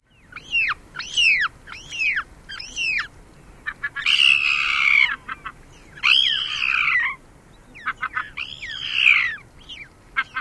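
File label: Ivory Gull calls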